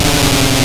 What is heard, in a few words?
Drone Pad, Wavetables; Cymatics; Essentials Wavetables; Serum